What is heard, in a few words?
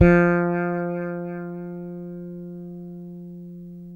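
fretless bass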